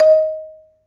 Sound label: Marimba, D#3; Terkelsen